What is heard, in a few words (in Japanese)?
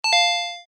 ピンポン